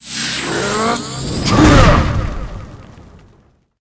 vampire attack, monster

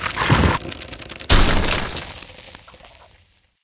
ice hound dead, monster

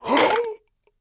dark evil wizard damage, monster

